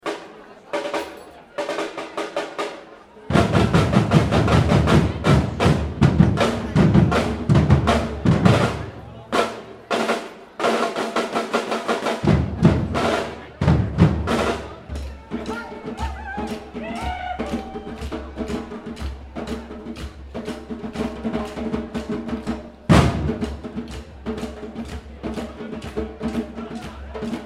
Street Festival Samba Drums, Brazilian samba drums; Brazilian samba drums played by percussion band. Crowd in background.; Concert Sound Effects; Lynne Music